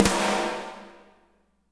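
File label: reverb snare, WET WHACKER; Spectrasonics; Burning Grooves CD2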